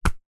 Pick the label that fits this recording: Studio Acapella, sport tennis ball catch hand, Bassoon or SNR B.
sport tennis ball catch hand